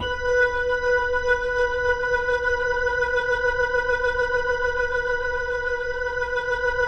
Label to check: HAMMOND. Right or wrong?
Right